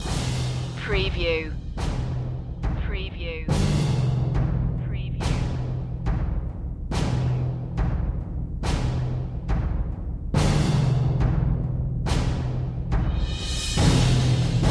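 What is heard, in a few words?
percussion mix; barbarian horde; solo vocal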